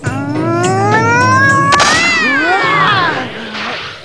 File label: Meeeowww